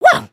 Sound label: cheer